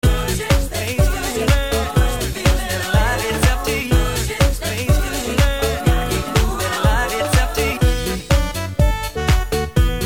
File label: house